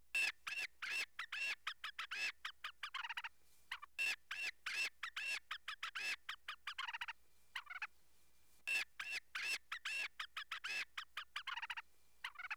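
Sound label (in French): les lagopèdes, oiseaux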